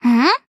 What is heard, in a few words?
hmm